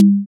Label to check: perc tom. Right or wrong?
Right